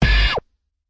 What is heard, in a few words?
Virus Kick, drum kit; REAL LIVE KITS; Osama Qureshi DrumKit